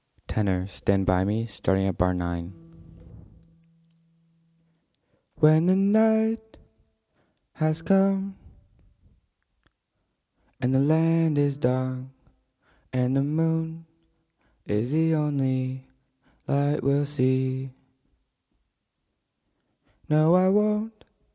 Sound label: tenor